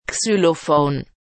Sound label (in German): Xylophon